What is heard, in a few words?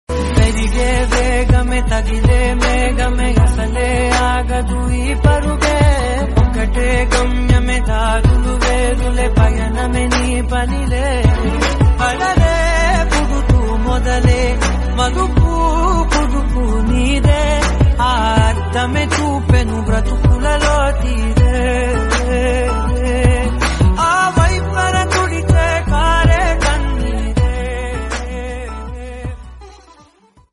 Latest Telugu Love Song